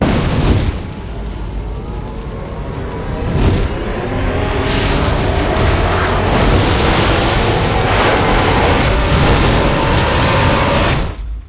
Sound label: near win